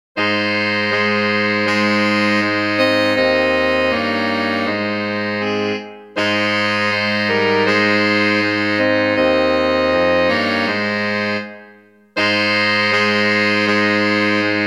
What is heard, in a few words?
Carol Sax